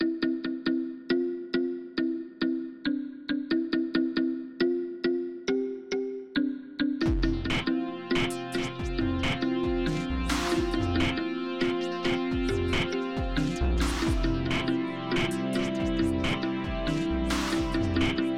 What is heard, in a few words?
pad